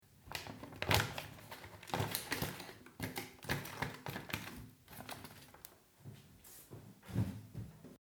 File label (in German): Kueche Atmosphaere